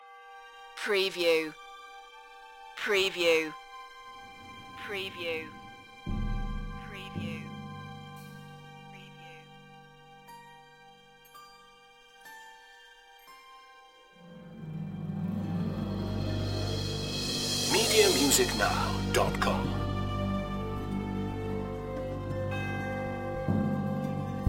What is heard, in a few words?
orchestral suspense loop